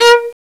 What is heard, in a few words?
SHRT, FAIRLIGHT CMI IIX STRINGS